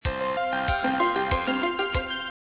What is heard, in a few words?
Ring